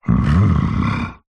horror yeti monster groan happy content; zapsplat

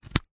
technology usb a cable plug in; zapsplat

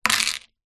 chain small decorative down on table; foley

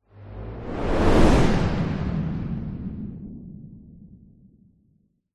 science fiction whoosh warping heavy fast; zapsplat

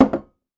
wine bottle down on table; foley; sound effects; sound effects one

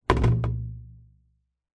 musical drum tom set down

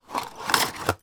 toy small remove from card box slinky

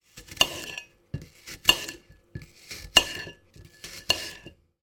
knife slice into apple on plate

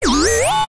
robot servo motor mechanical whirr fast movement; science fiction

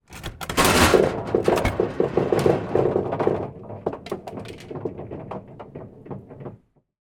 sport pool table coin operated balls release

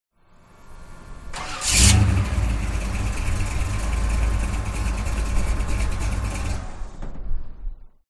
VINTAGE CAR Chevrolet 57 Start Engine Exterior; TRANSPORTATION

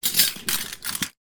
household several items of cutlery pick up

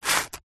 industrial sand paper single sand plastic rub

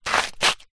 footstep scuff gravel dirt; foley

